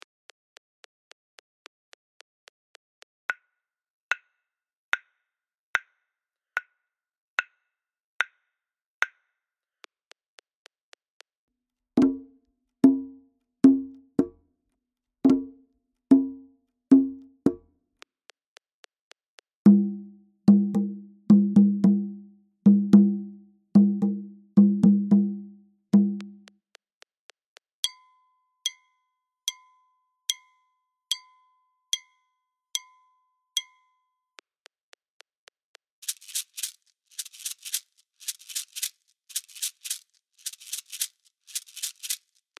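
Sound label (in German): Bikutsi 110bpm, Afrikanische Rhythmen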